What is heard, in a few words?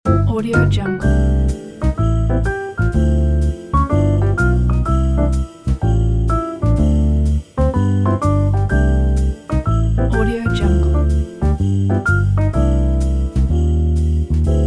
christmas jazz